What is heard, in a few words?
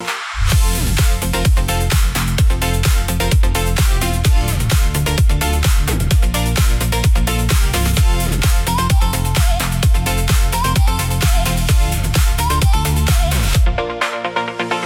loop; opening